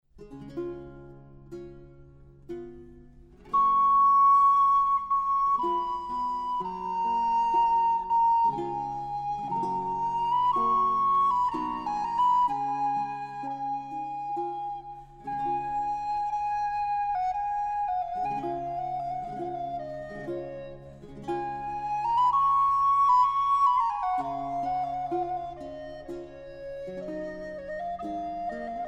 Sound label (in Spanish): Pastoreta Ychepe Flauta; Anonymus Pastoreta Ychepe Flauta: III. Adagio; arcantus Musikproduktion; El Galeón